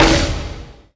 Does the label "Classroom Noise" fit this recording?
No